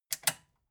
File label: metal button click power off fast